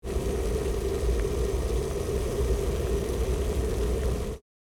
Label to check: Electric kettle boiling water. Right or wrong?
Right